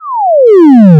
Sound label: pause, laser; kokogems